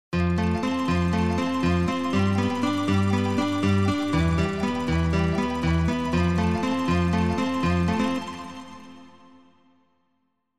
Korg M1 Nylon + Mand

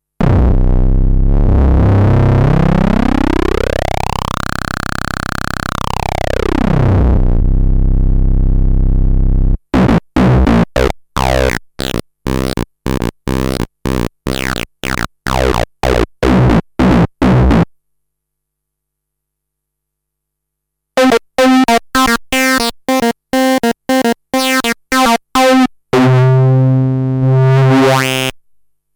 distorted bass lead

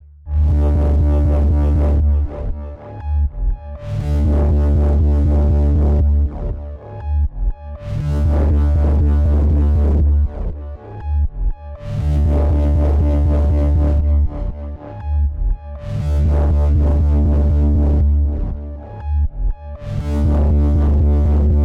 Power Generator

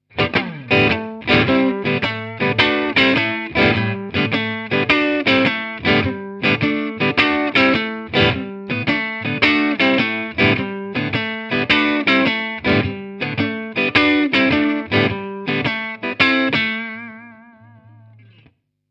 Harley Benton Tube 15 Strat Clean

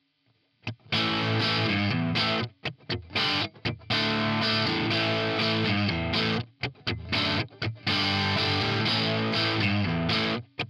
DK24 pos4 DIST; Charvel

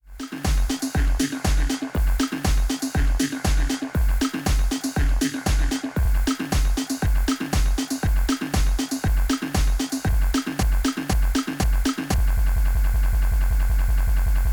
Loop Roll

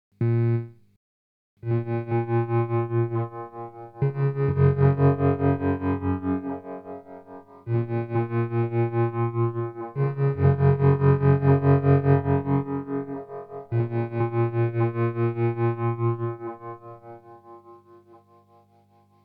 GFI System Duophony XY Mix LFO Synth

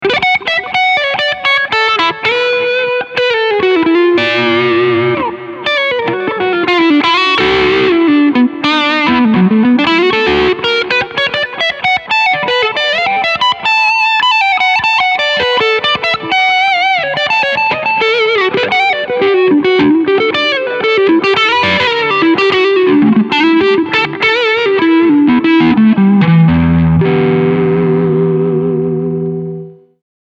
Low Gain

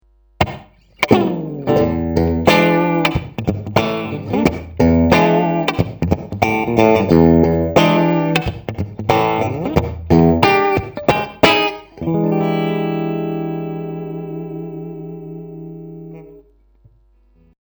Bridge Humbucker

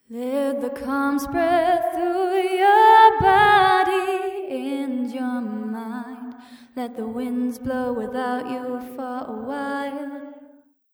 Vocs Unnatural

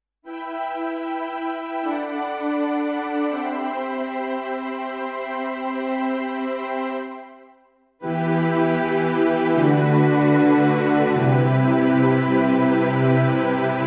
Strings

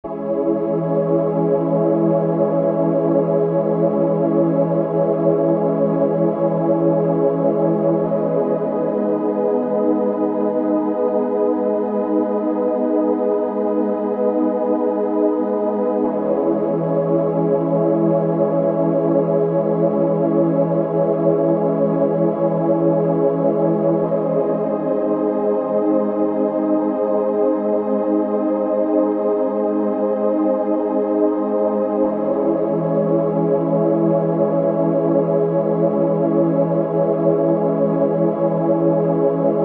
Lazy Pad; MobileMusic No1

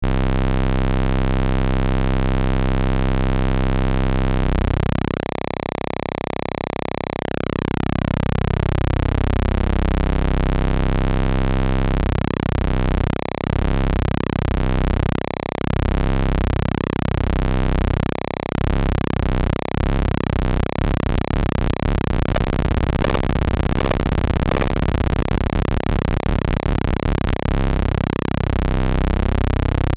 Malekko Manther PW Modulation

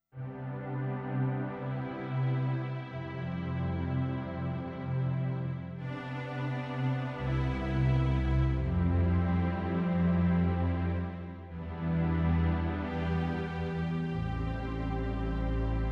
Numa Concert Pad

